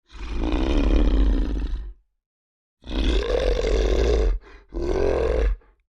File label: Creature Large Vocalizations; Monsters; Horror